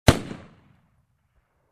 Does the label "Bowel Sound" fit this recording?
No